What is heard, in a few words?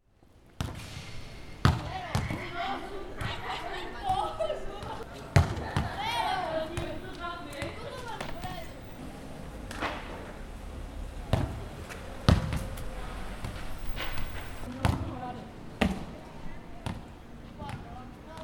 Boys Playing Football